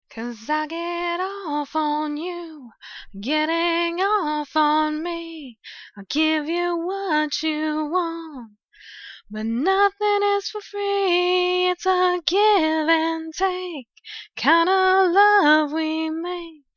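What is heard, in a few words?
singing, female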